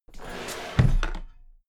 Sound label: drawer cabinet equipment close heavy